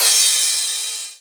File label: long hat